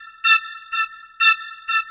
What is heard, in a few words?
house synth 125 A, keys